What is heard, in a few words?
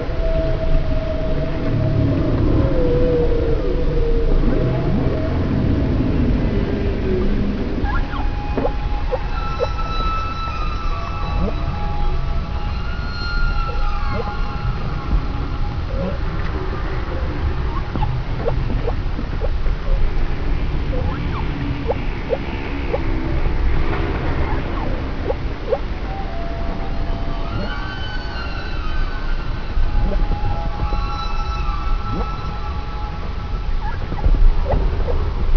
song; underwaterparadise